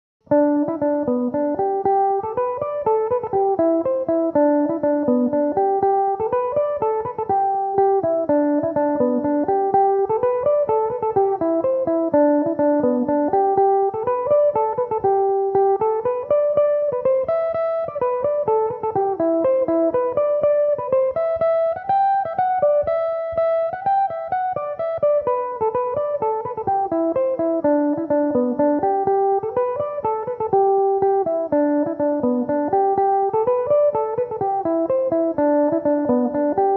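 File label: G major, Irish mandolin; Belmuse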